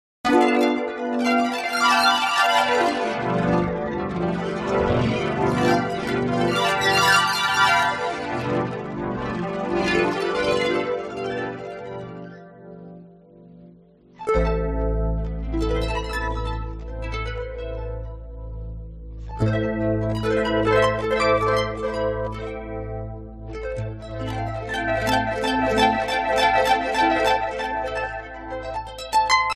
arpa

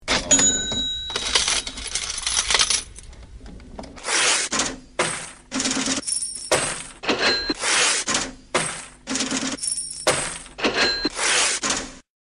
caja registradora

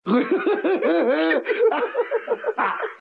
risa; profesor rossa

